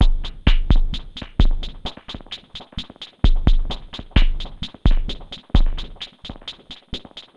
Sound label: Octave Down Loop 130BPM, DrumLoop Ghost Layers